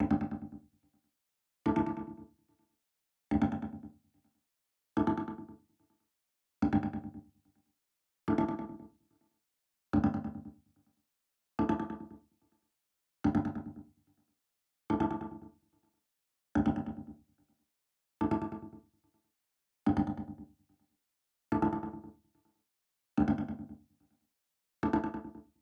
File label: Rhythm Organ